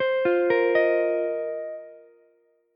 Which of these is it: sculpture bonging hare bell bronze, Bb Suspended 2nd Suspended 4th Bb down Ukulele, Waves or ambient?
Bb Suspended 2nd Suspended 4th Bb down Ukulele